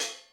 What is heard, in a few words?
Hat